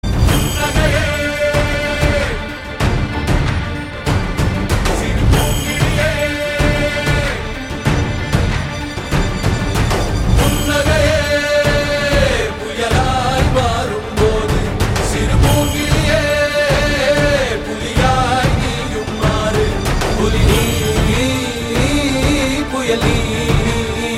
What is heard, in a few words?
Ringtones BGM, Tamil; Ka Pae Ranasingam